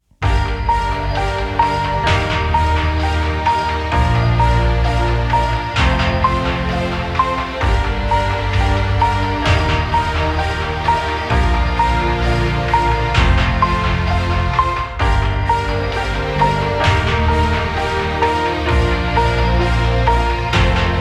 欢快的背景音乐; 3分以内

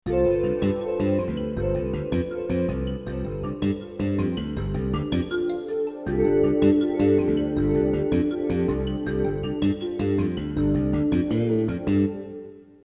片头音乐

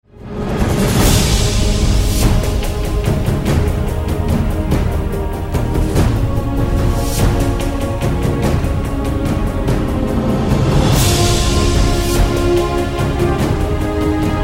专题片背景音乐

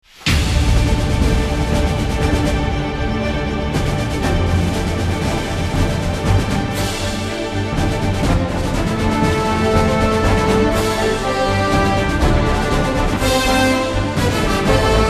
片头音乐

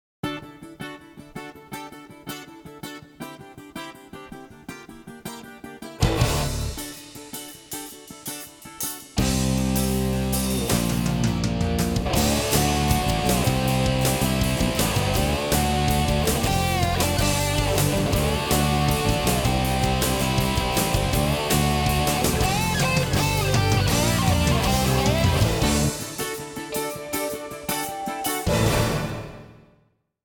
片头音乐

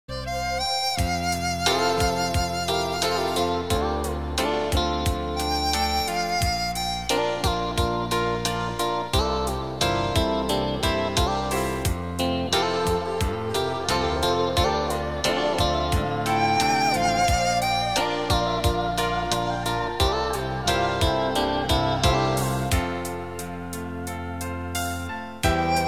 广告片音乐; 广告片背景音乐; 3分以内

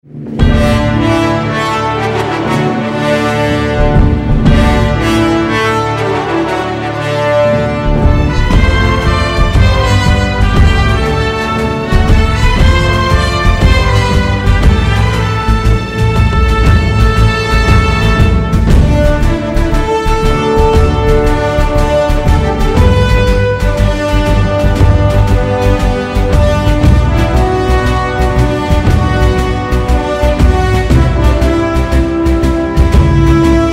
激情的背景音乐

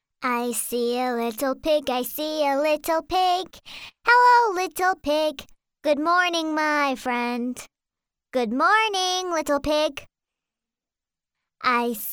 外籍女英 外语 美式英语 儿歌; onthefarm

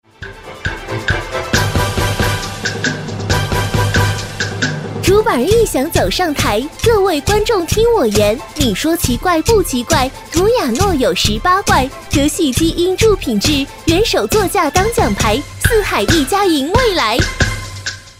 歌曲翻唱 快板, 女

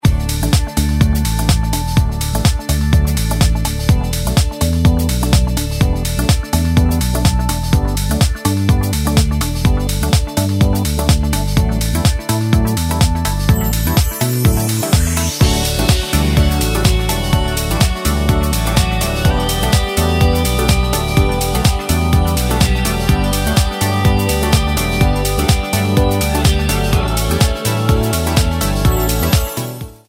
专题片背景音乐

